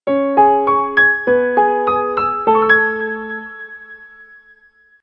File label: fortepiano, notifications; Doov